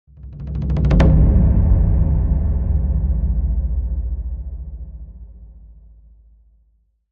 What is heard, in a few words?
Low Roll Hit